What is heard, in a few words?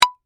Tonfa Strike Impact, Warfare, Tonfa, Strike, Impact, Wood; Swords (Sound Effects:Combat); BlastWave